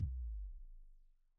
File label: transition impact hit